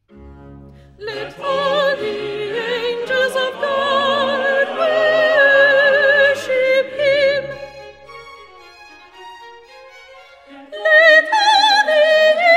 Let all the angels of God soprani; Messiah; handel messiah

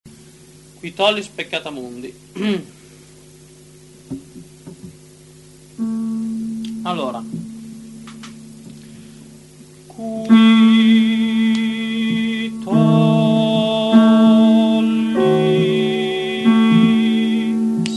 Tenori; Qui tollis; Vivaldi; Gloria

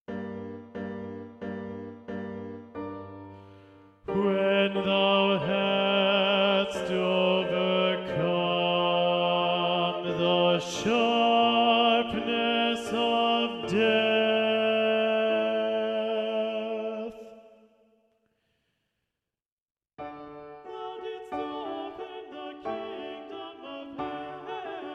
Bass; When Thou hast and Thou didst open; Handel; Te Deum

